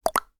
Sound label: Retro Videogame Click Menu Option